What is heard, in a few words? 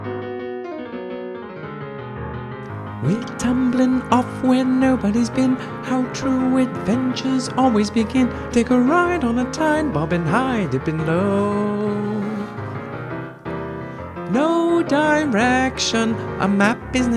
vocal guide; audition